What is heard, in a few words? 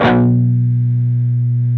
PRS PWR C3F, Guitars; PRS PWR CUTS